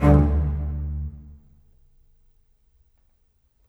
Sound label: Bass Cello; Sonic Foundry (Sony Creative Software); Orchestral Series VOL-4; Rock and Pop; Rock-Pop 15